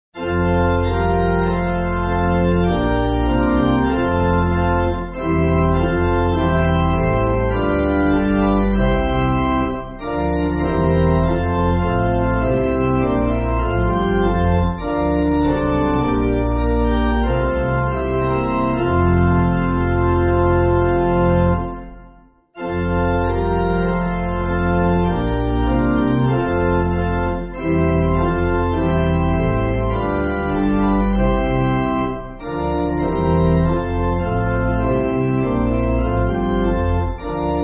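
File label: Pipe, Music Backing Files for Churches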